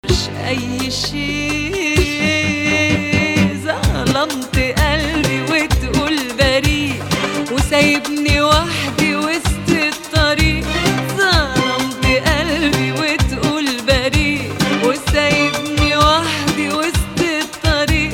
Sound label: Nahawand